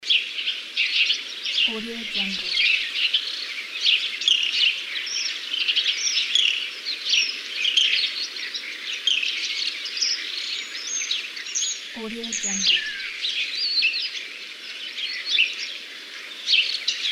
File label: forest ambience; آمبیانس جنگل پر تراکم